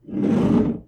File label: Scrape Material Neutral